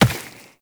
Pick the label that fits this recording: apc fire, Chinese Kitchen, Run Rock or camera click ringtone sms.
Run Rock